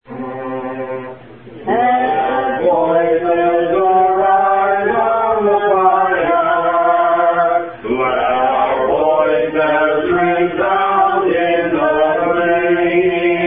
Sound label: offering song